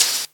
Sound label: Open Hihat; Vengeance Samples; VEE Cymbals